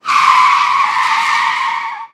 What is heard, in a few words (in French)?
crissement de pneus